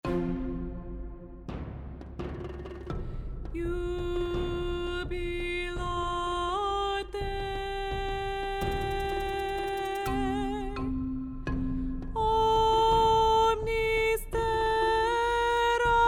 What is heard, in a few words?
Soprano Only